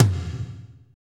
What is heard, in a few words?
TOM, Power Ballad Toms; Northstar; Drumscapes Roland